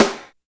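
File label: HARD snare; East West - Phat & Phunky CD2; 90's Sample CDs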